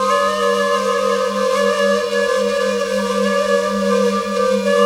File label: ambience; Sonic Foundry; Sony Creative Software; Ambient Grooves